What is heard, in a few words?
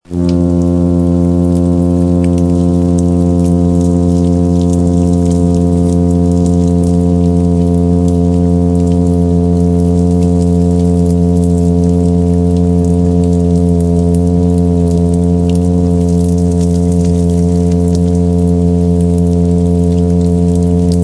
midshipman hum